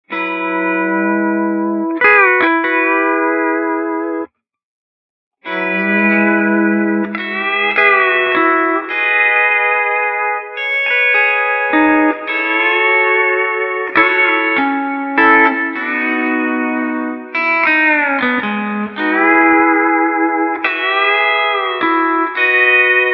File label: Room Pedal Steel, reverb